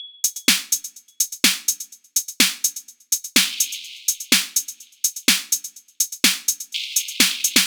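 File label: 125, DRUM LOOPS, TOP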